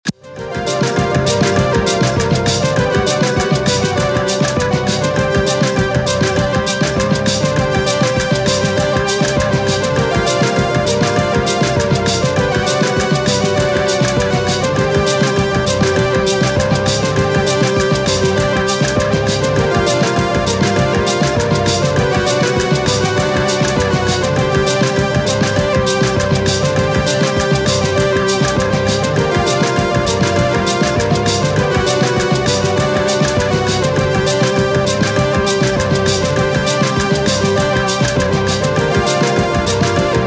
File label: sequencer cassette tape; weeklybeats-2020